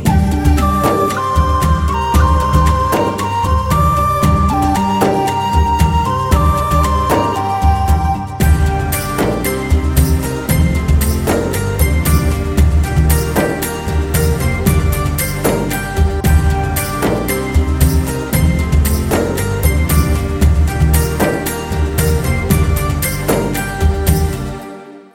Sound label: Drum Beats; Telugu Ringtones; 25 Sec; from Mr Pellikoduku Movie